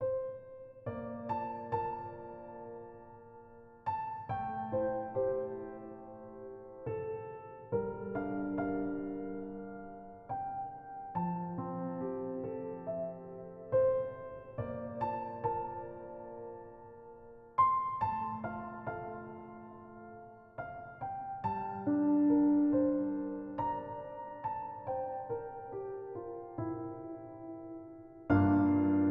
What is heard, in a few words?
Music HEART; Bloodmasque